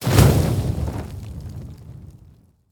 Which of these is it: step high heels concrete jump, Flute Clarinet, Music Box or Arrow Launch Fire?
Arrow Launch Fire